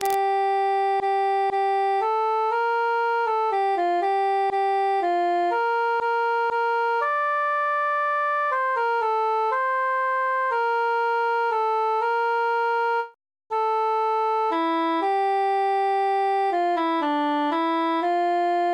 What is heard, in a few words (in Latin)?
cantus solo